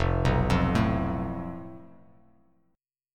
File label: F Major Flat 5th; FMb5 arpeggiated; JGuitar